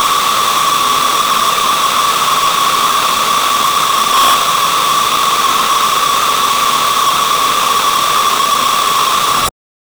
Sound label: a loud and scary glitchy, glitch